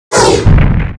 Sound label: ATTACK